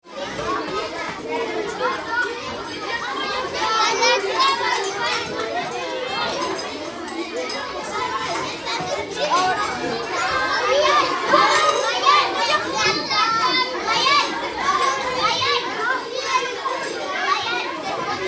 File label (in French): dejeuner